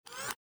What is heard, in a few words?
servo open; disney; bh6